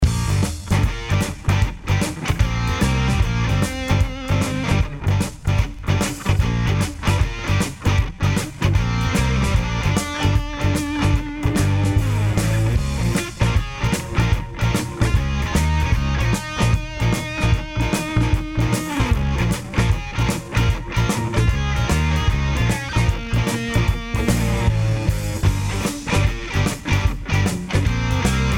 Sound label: blues